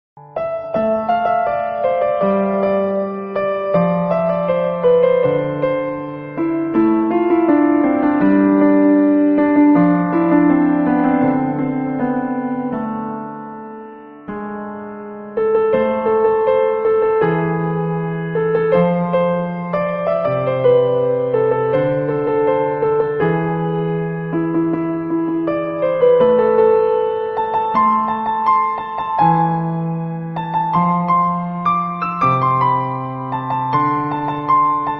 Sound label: Piano Bgm